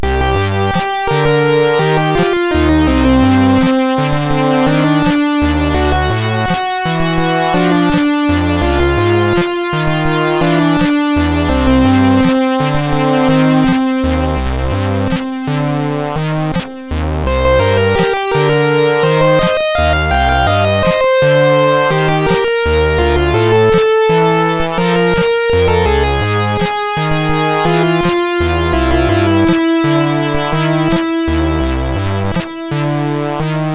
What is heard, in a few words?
Sad Gameover, MOS6581R3; Shade